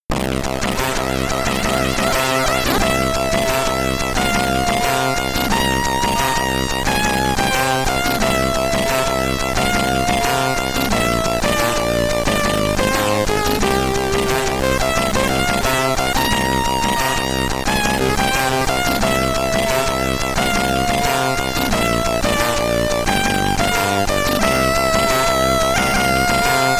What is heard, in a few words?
SID Stereo; Knuckle Buster; Commodore 64